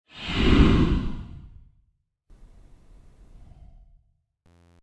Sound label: Robot Monster Breath, science fiction sound effect; Andrew Fleming